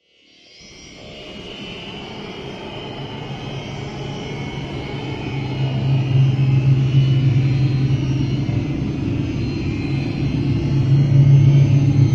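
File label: Cosmos Metallic Screech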